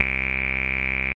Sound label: filtery synth bass